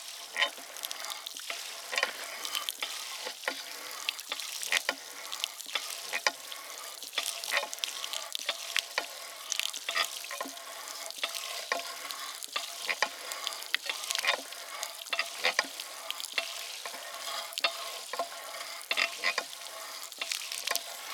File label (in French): BRUIT Mecanisme pompe